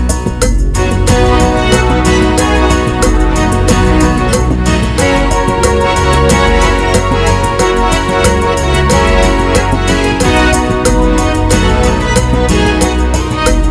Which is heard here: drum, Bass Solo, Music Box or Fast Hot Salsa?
Fast Hot Salsa